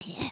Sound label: generic build; emotes